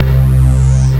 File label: ANA BASS; AKAI S6000 CD-ROM - Volume 1; VOCAL ORGAN; B VOICE & BELL